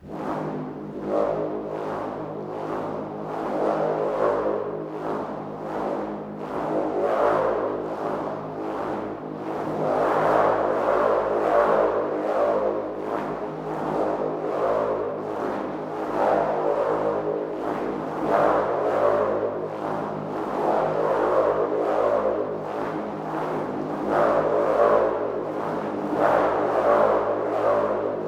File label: sfx spiritcatcher G; best service Blue Box